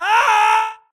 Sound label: grunt death crush; Titanfall 2